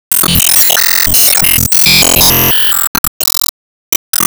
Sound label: Xmas Bell